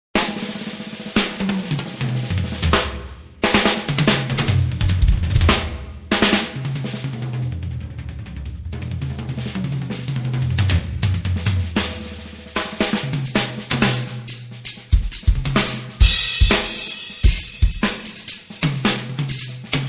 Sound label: drum